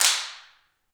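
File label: hand clap; Roland L-CDX-01; Clap & Snap; Clap Modules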